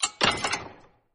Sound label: create tink sound; Arc of Templar